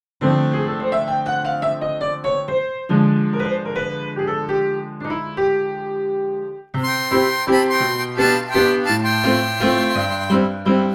piano

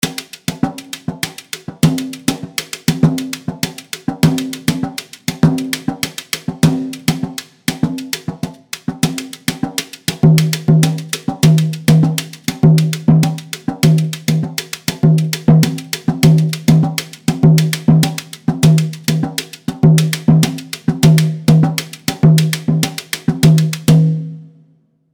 repique single hits

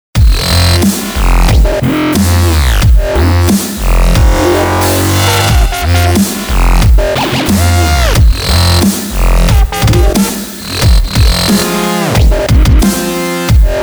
funkstep

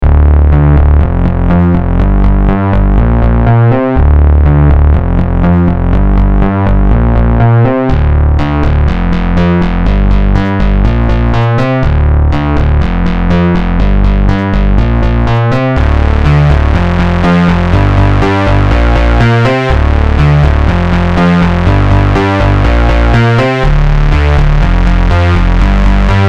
analog bass

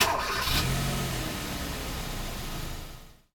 outside car start up

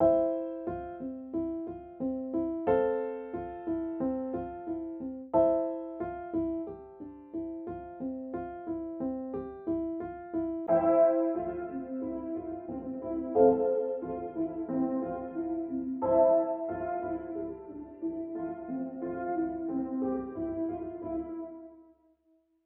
piano long ago dry wet

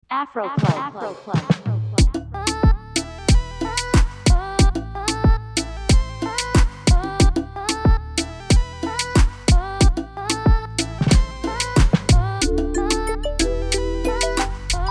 afro keyz zouk compas

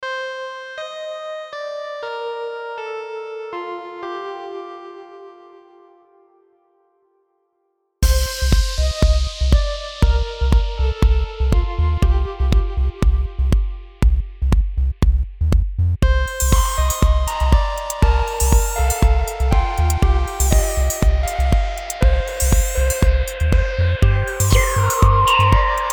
tension builder

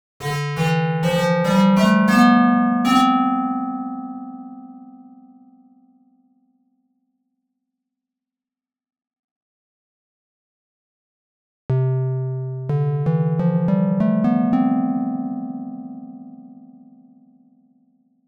digital waveguide test

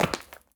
boot footstep; nova sound

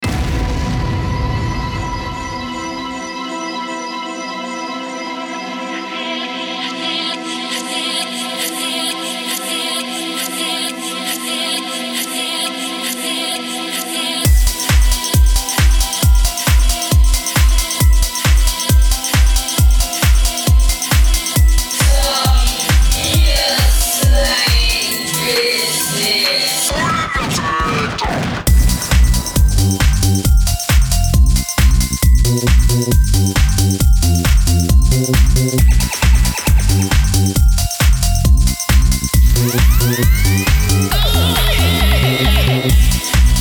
garage

